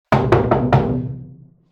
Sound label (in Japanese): 和室の扉を叩く音; door